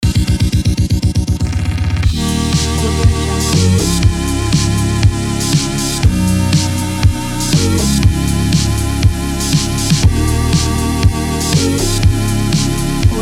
electro pop

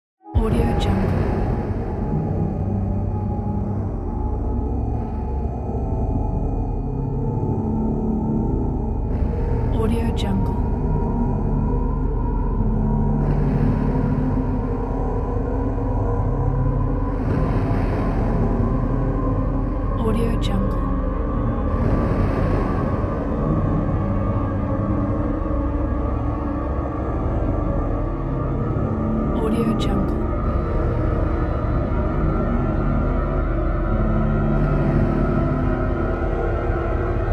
drone suspense horror

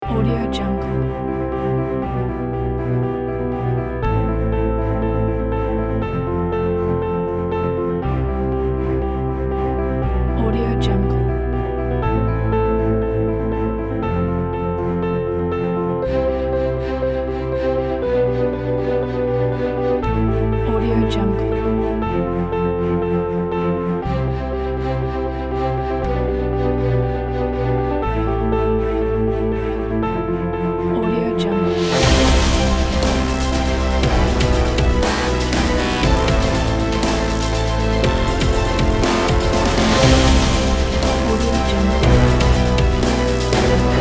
rushing clouds

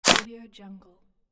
foley item grab pickup

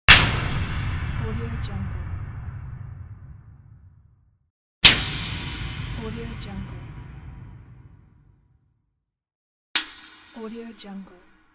metal dramatic hit

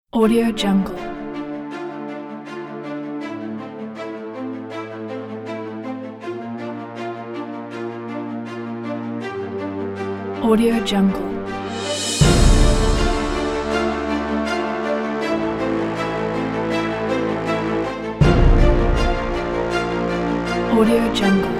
epic political cinematic

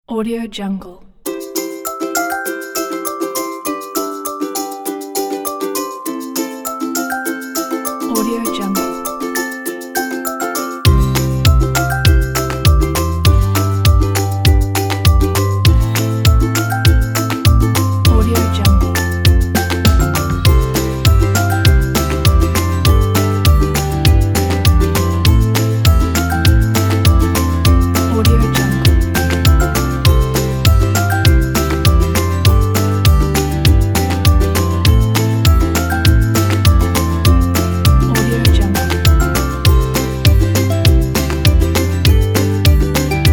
happy folk

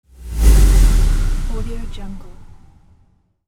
fast soft transition

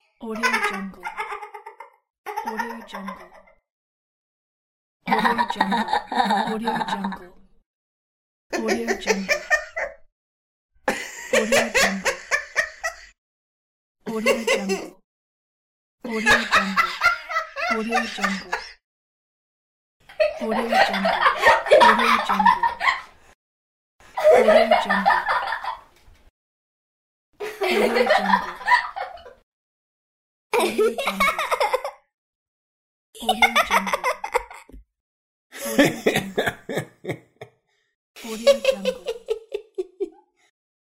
laugh sound pack

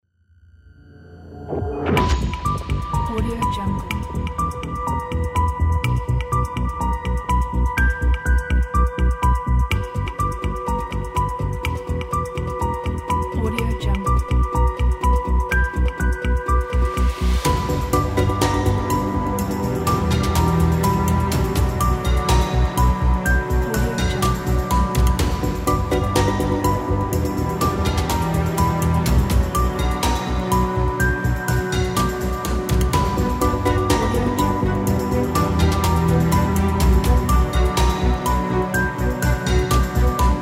suspense thriller; maryjanemusicstudio

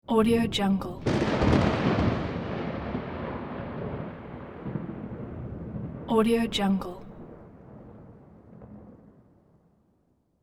lighting strike

